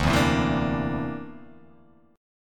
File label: D 7th Suspended 2nd Sharp 5th D